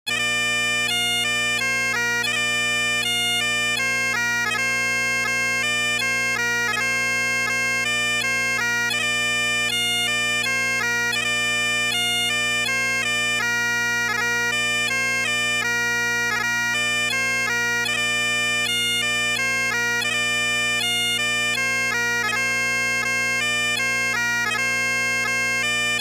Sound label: Whistle, pipe band; The Eagles Whistle; national youth pipe band of ireland